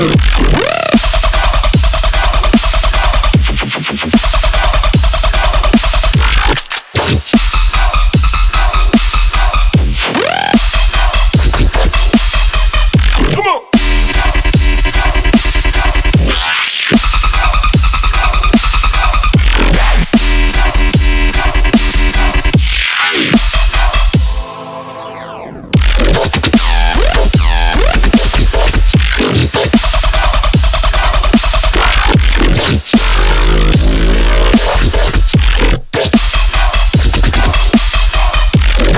Drop, wub wub wub; TF2Maps

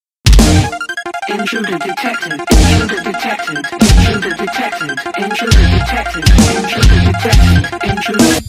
buildup; TF2Maps; chillskies; wubwubwub

